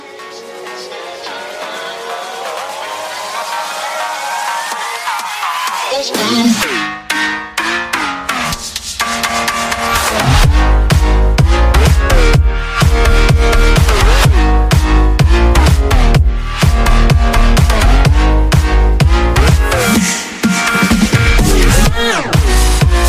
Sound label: Groove, ringtones